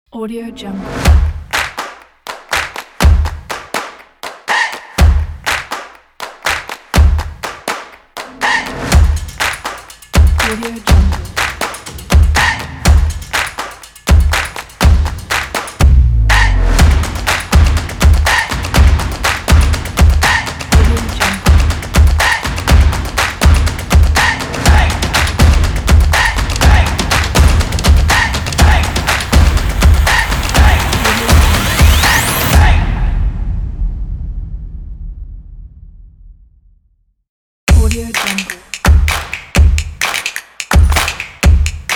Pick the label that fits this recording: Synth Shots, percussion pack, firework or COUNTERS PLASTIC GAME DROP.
percussion pack